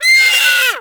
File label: Sea Bird Vocal Death; Characters; Warframe; Ostron; Hunting Critters